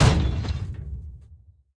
Break Metal Heavy

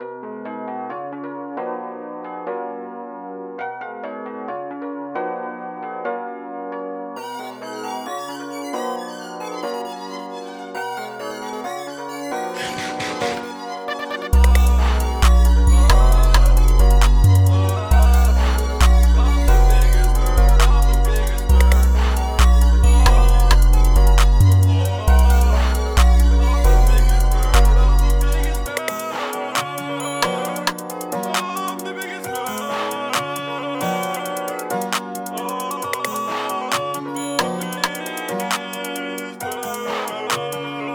Instrumental; friday night funkin vs ross; BiggestBird

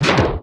Enemy Daruma Strike; disney star smash android ios mobile gamerip 2020

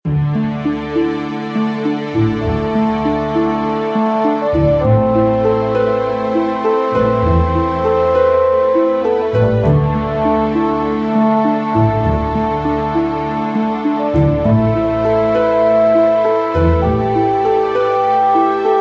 Potion Crafting

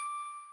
synth; Lofi Room Demo

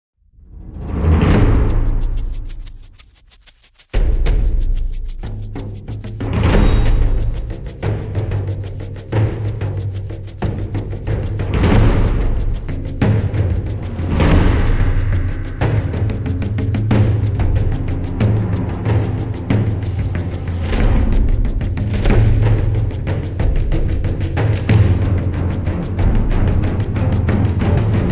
combat opener

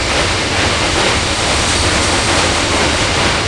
Train Passing

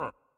Hover Hands

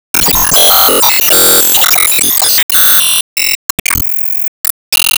Ingame Playstart

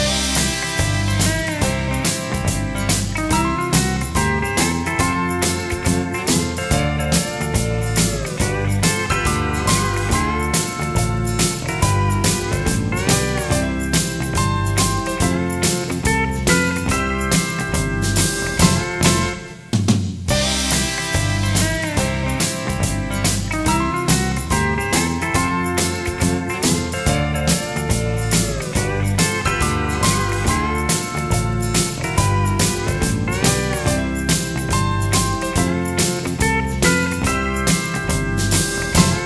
Disco; Casino Inc

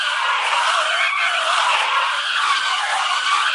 Cruiser Skid; lethal company

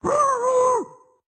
Dash Wind Up; Caffiend Caelan; Going Under

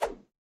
Swing Small

